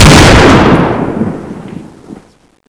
m1887, weapons; xmas

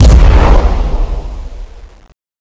attack shockwave; zbs

